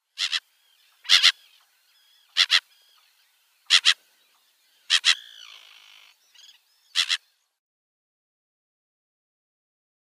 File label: Slender-billed Gull calls at nest